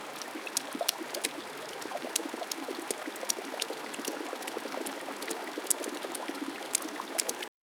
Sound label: gentle stream bubbling